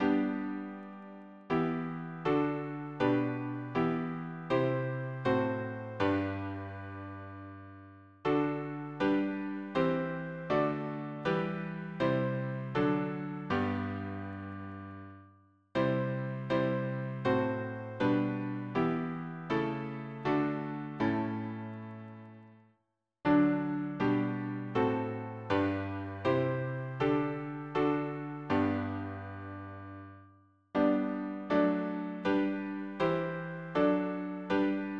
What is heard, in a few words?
harmony piano; hymnary